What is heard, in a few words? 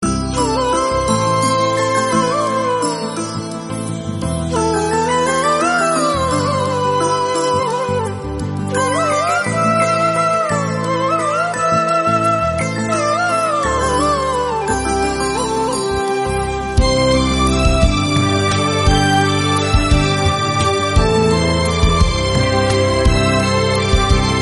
flute